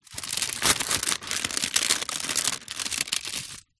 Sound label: foley envelope windowed open; zapsplat